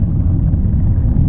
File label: water surface; Quantum Redshift